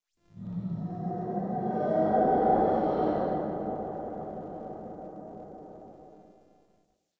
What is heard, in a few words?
Exterior Distant Calls Scared, Ambience; Warframe; Duviri